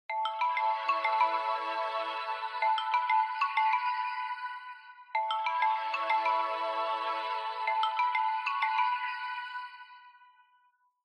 Smartphone Alarm Speaker

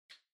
Light Switch American Toggle Far Dry Gentle

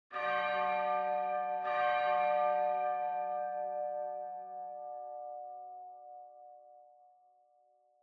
Church Bell Far High Mid

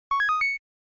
Arcade Action Score

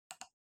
PC Mouse Far C

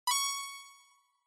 Inspiration High; Onoma

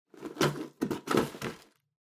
Drawing Box Box Open

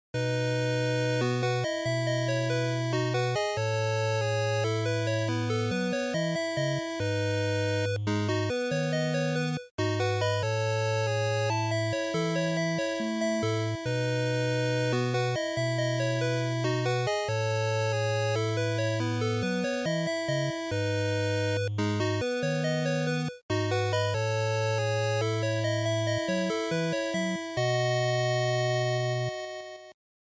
NES Shooter Ending

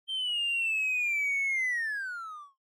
Motion Falling Whistle Long

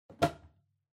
Washer Front Load Filter Lower Cover Open

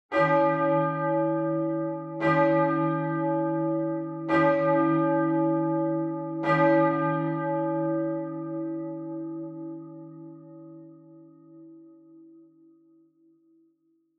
Church Bell, Low Long

